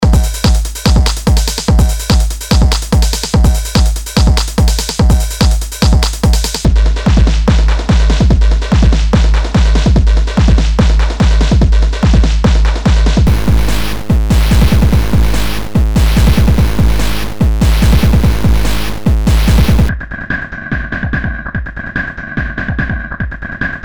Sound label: Industrial Drums